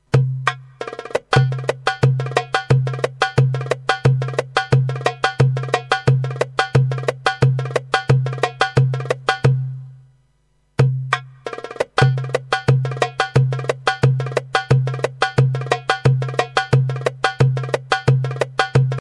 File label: darbuka; roland gw8; trakya